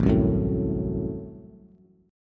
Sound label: A Minor 6th A Bass; Am6 strummed; JGuitar